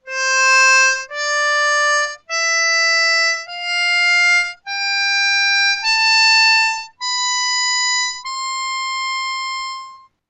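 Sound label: C Major Scale Up, harmonica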